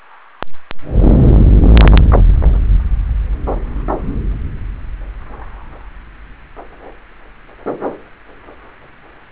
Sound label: Earthquake Sounds